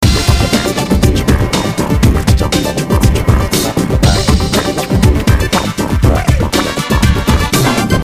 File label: loop